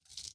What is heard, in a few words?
Inspect Shell Mvt H, weapons; odin